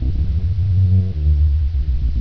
alien twow, ambience

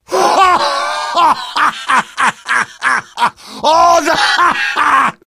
laugh long; vo; demoman

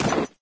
player snow; Source Engine; dod

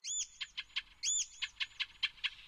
warbler, ambient; Source Engine; tf

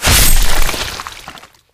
grappling hook impact flesh

